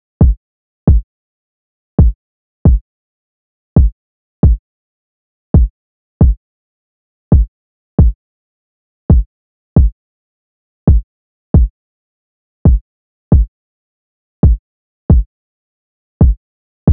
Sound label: kick